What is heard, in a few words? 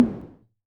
Tom High, Korg KR-55B